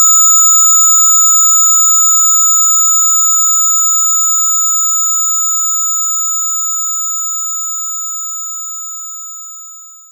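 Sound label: Glockenspiel Loop C4; knights try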